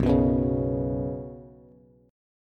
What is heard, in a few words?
B Suspended 2nd B down Bass, Bsus2 chord; strummed; JGuitar